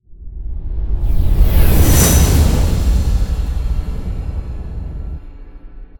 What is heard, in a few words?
Transition End; Everspace 2